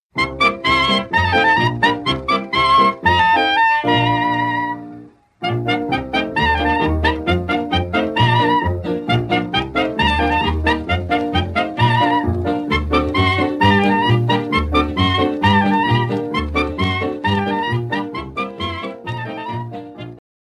Passe Pied; France; Carhais

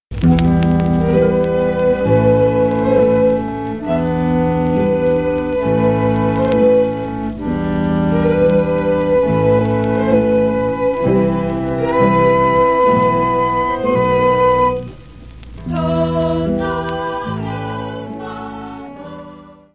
Czardas, Slovaquie

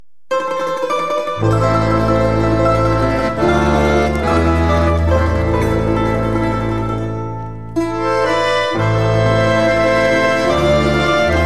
Chorovod, Russie

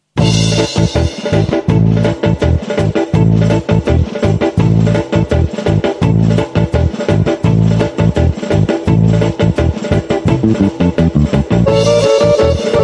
Cocek - Macedoine

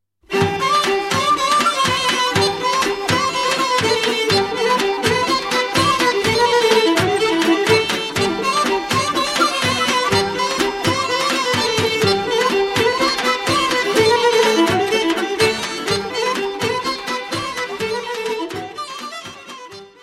Horo - Bulgarie

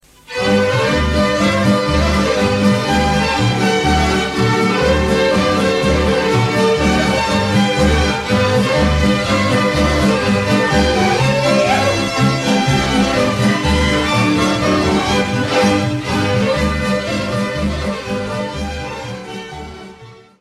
POLKA, Danemark

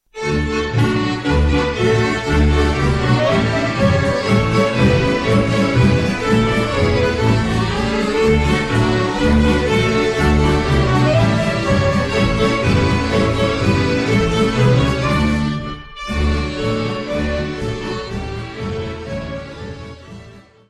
POLKA - Danemark

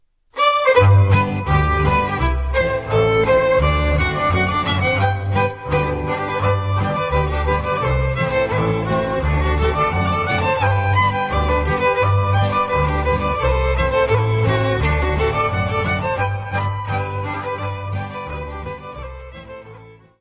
Scottish, Suede; Scottish i Turer

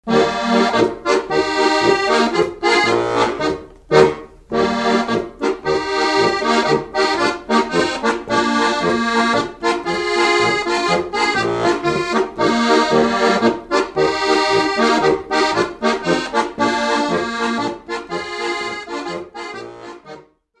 Gallizische Kreuzpolka - Autriche

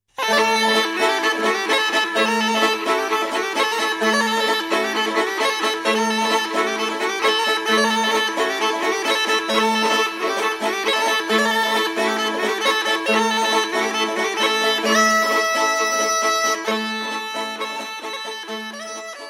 Horo - Bulgarie

